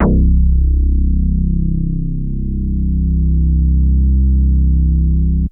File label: Big Synth Basses